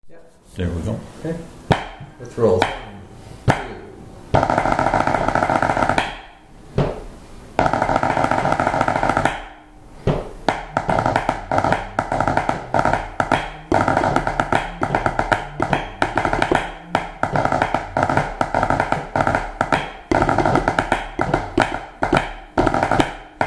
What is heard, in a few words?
Massed bands Drum Beating 2 4; drummers music library